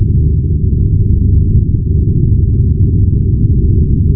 rumble; quake2; coop; slaughter

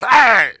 pain; quake2; redux; quakeguy

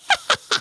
pain; quake2; railz; Bomberman

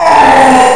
pain; quake2; mnhcoop

